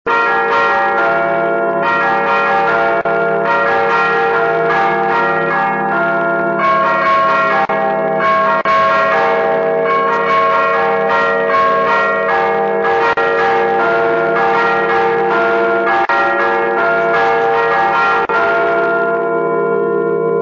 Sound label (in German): Zweiter, glocken; toene